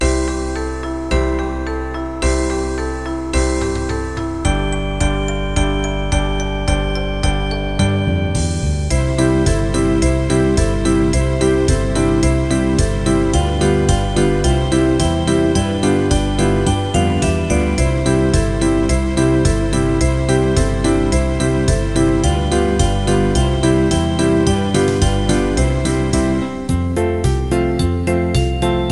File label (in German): schulmusik jingle bells orffpartitur